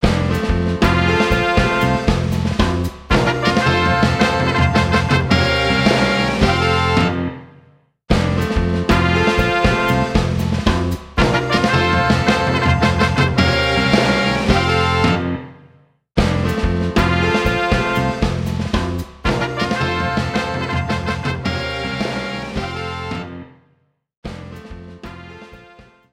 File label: Bayou Complete, Music; Frog Music Streamed